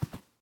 cloth, step; STALKER; minecraft

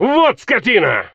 stalkers hit; customnpcs

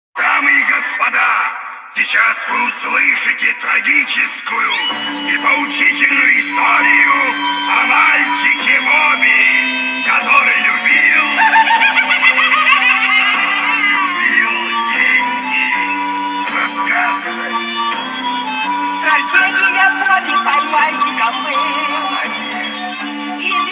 radio music; STALKER

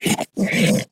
snork take hit, mutants; STALKER; customnpcs

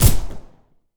TT-33 fire; STALKER

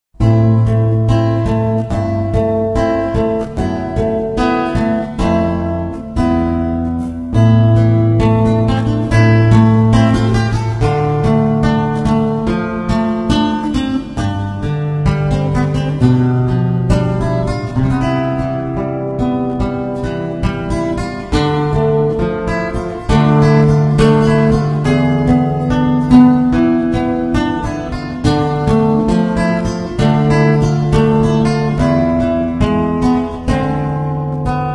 guitar; STALKER

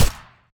g36c silencer; STALKER

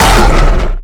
giant take hit, mutants; STALKER; customnpcs

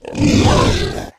flesh aggressive; STALKER; customnpcs

